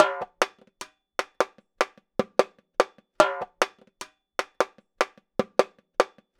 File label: Timbali Groove, Latin Percussion; Sonic Foundry (Sony Creative Software); Joe Vitale Latin Percussion; Grooves